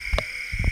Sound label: night frogs, South India street recordings